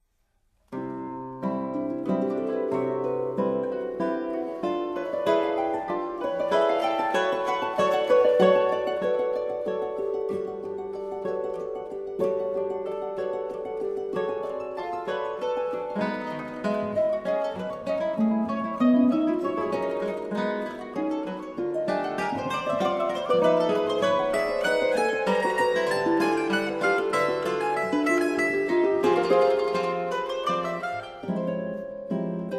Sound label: Carillon